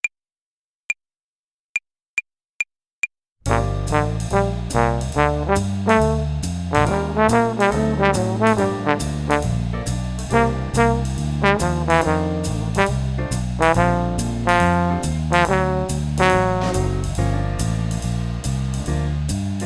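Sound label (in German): Swing Phrasierung Übung; play along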